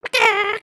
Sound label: chicken cluck long; emotes